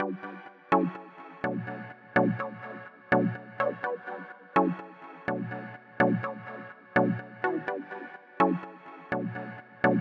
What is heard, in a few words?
Plucks